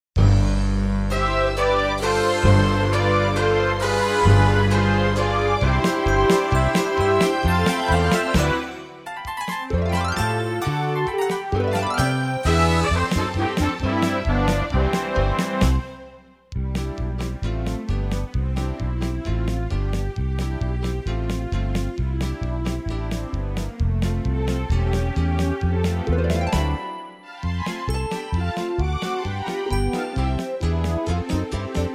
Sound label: brass minus